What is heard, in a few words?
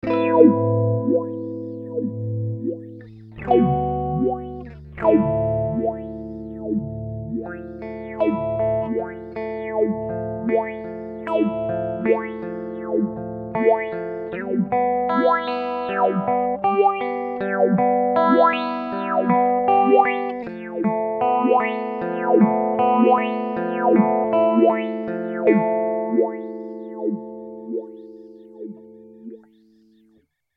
Fender Highway Phaser